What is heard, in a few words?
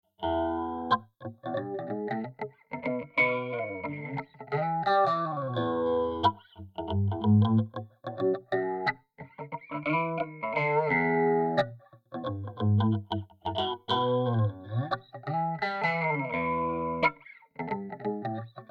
Funk Phaser